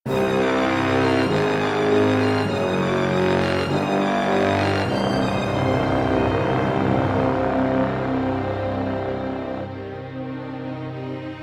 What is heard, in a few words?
Symphony